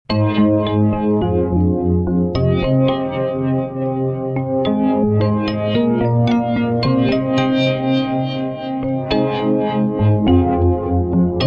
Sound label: MAGIC EP; S90